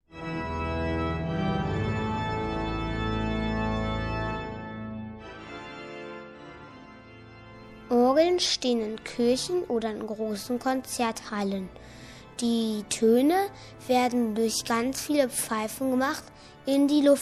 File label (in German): orgel